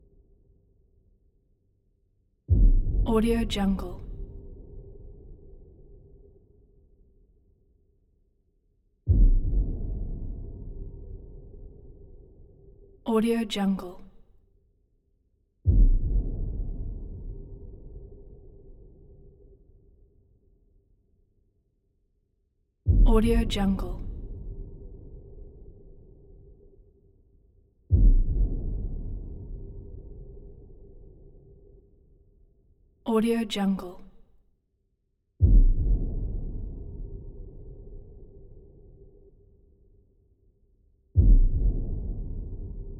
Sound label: evil pulse heartbeat like throbs deep impact